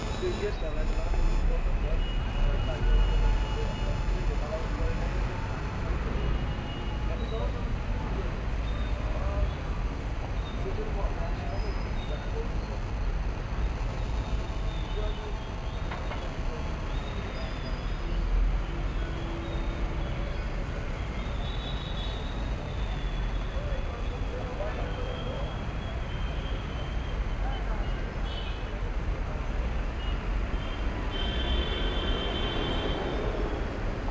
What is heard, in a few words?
Namo Buddha Rooftop; Boudha, Kathmandu, Nepal